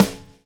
GRV, Groove; AMG Gota Yashiki Groove Activator

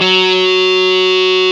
GTR FUZZ; Club-50 - Foundations Roland; Dirty; Stack

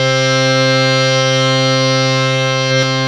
HARMONIUM, World Instruments

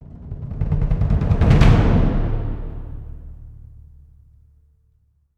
Orchestral Roll no cymbal

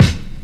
KIT, Dance Mega Drums, HIP; Best Service

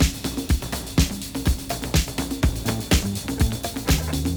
apollo break, breakcore

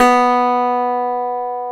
GTR, Dobros, National

